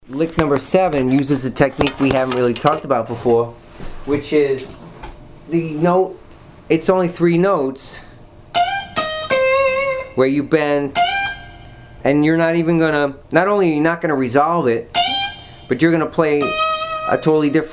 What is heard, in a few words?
string bend lick